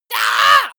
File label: monster painful cry high